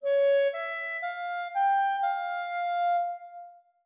5 notes F min

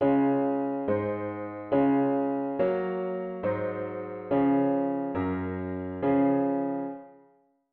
root V7 bass

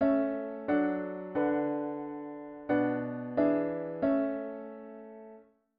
chord prog chunks diat inv and V7

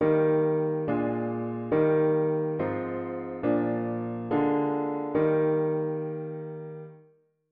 Harm Dict diat inv V7 inv bass

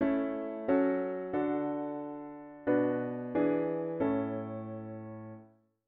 chord prog chunks diat inv and V7

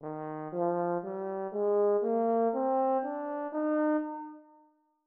major Eb3

